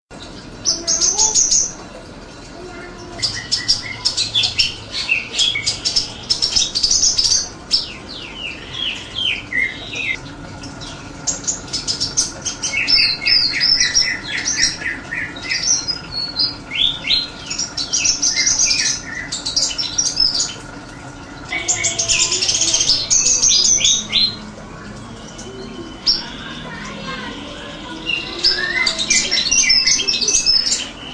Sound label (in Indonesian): burung serindit